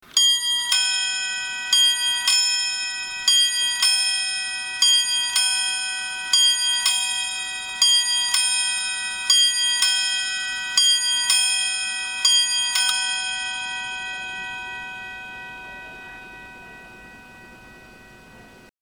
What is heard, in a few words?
Chime; Q2400-Series